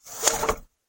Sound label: toilet paper